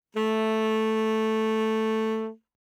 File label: Alto Sax No Vib ff A3, Eb Alto Saxophone; Woodwinds; MIS Pitches - 2014